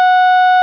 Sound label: bass E, pipes